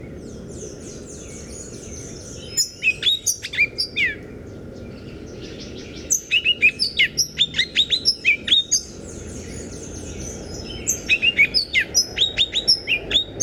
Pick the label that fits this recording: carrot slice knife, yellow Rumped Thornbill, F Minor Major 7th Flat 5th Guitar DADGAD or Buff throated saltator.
Buff throated saltator